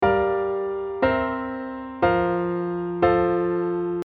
F sus4

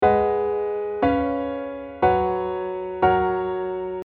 F G sus4

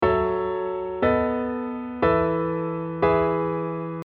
E sus4

